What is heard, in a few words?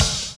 DOUBLE BD; Univers Sons